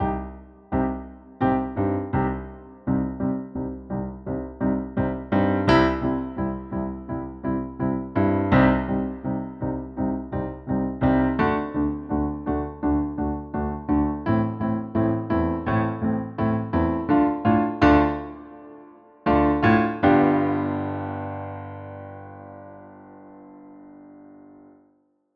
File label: opening duet chords